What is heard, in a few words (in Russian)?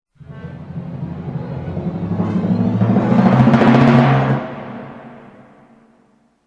S barabanami; С барабанами; Звук саспенса